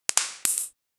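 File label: graphite sound and dripping, art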